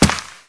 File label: rock climb, step